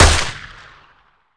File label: 9mm fire 2d, pistol; weapons